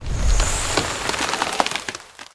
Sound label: spell ice wedge; archer